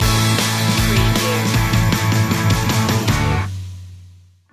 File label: free flowing stinger